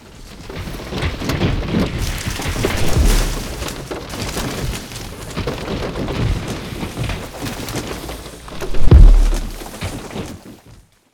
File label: tree falling